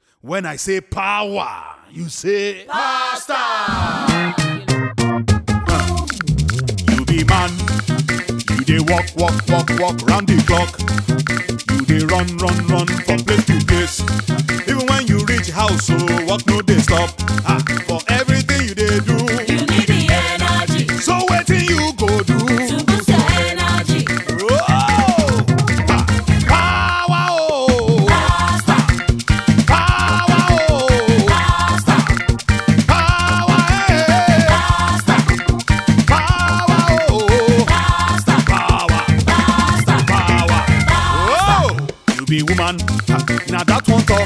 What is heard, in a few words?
Power Pasta Theme Jingle